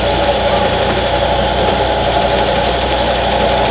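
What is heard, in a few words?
cement mix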